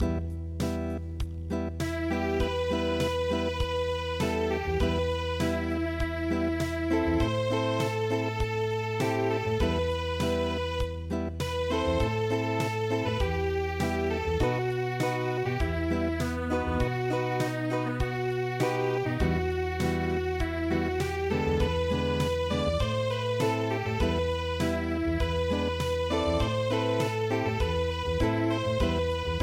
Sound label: Unplugged